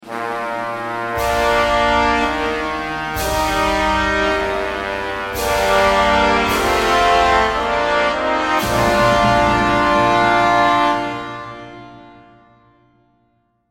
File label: fanfare